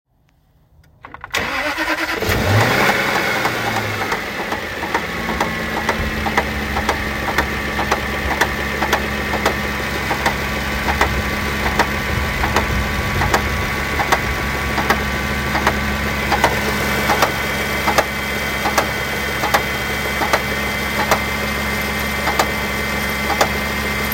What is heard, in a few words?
2007 jaguar xkr engine sound starting; X150